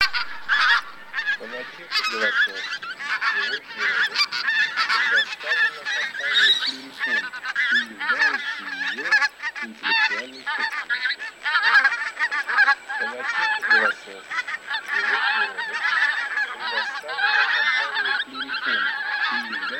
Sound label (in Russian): Гуменник Oche Zampe Rosa; plurifon